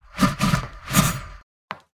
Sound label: npc strike